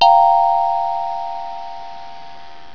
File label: Chime